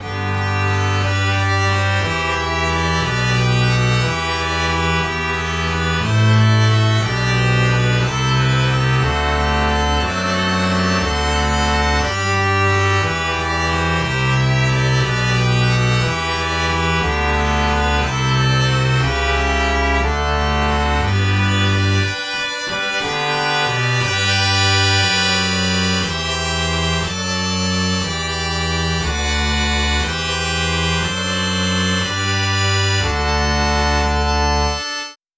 contrabass

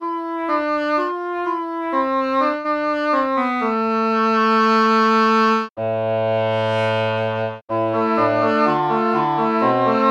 english horn

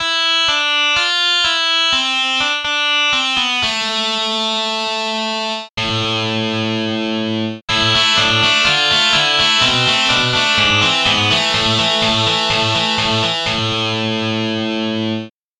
distortion guitar

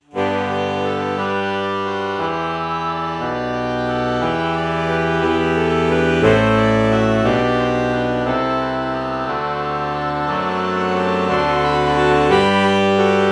alto saxophone oboe morph

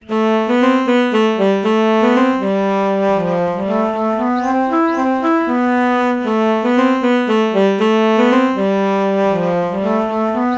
alto flute alto saxophone melody morph low

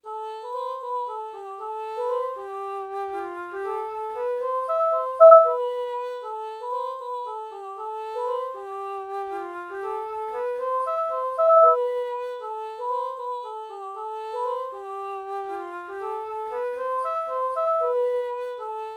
alto flute ah melody morph

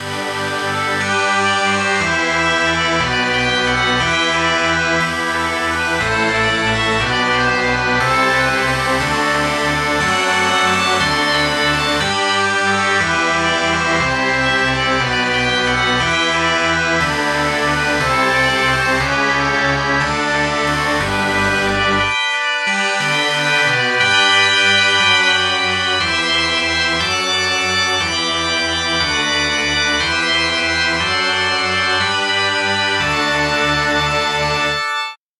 bagpipe

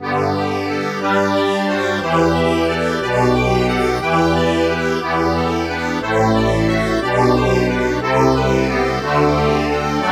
accordian bach sf2

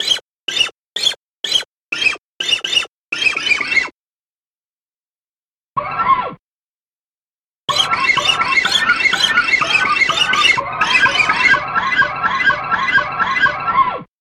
fret noise

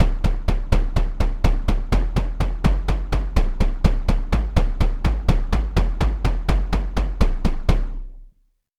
melodic tom bass

